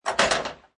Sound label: Billiard Pool Snooker Ball Delivery Mechanism In Table; Pool table, Snooker Table, Billiards Table: Ball delivery mechanism inside table